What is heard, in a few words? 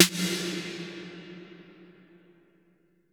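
XXL HALL Snare 808; Masterbits - TR-Minator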